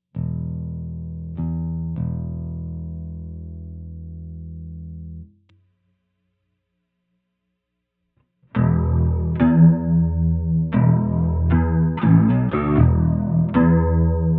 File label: Fender Bass